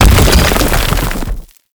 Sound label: Thumper Bot Thumper Impact Frack Rumble; Warframe; Grineer; Movement